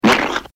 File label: impact; Quicksilver